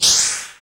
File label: FX Dance